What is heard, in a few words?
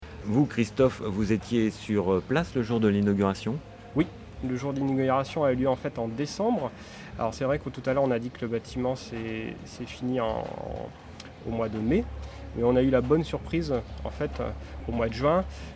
EDM